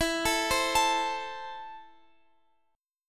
E Suspended 4th Sharp 5th E, down, Guitar, Open F; Esus4#5; arpeggiated

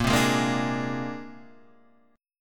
C 7th Suspended 2nd Sharp 5th, Bb

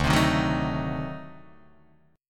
D 7th Flat 9th D down Guitar Open D

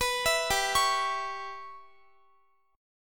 G 6th Flat 5th, Guitar; G6b5 arpeggiated; x,x,21,21,19,20

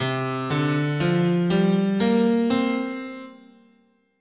C Suspended Pentatonic, scale

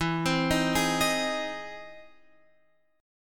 E Augmented Major 7th, down Guitar Open F; arpeggiated

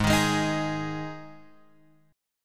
G sharp 5th G sharp; G#5 Chord {4 6 6 X 4 4} strummed

